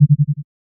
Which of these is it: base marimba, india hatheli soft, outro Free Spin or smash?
smash